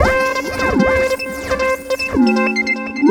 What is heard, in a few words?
Synth Seq; Keys; Synth Sequences; Drum & Bass